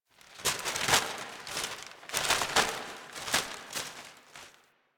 Paper Blowing C; Warframe; Ambience; Grineer; Carnival

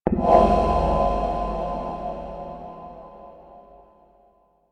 Stinger Small A; Rell; Warframe; Harrow; Horror Stinger

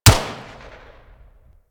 Pistol Shot, Weapons; Harlequin

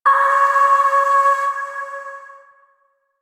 Void Shawzin Oct Three C Sharp; Warframe; Zariman

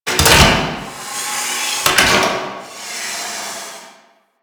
Hydarulic Mach Movement; Warframe; Ambience; Corpus

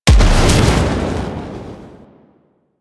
Rocket Launcher Explosion

